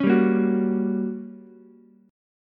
G Minor Major 7th Flat 5th, G, down, Ukulele; strummed; JGuitar

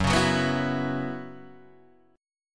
F sharp 7th Suspended 4th, Guitar, Open G

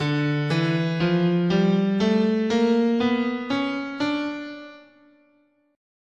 D Bebop Melodic Minor, scale

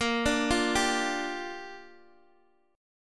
Bb 6th, Guitar, Open G

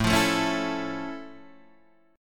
F Augmented Major 7th A

